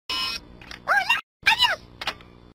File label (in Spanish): burbuja diciendo hola